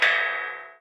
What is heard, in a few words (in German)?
metallklang; klang im schnee